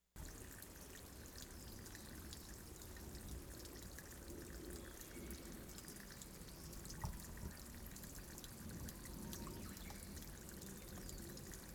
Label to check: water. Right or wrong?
Right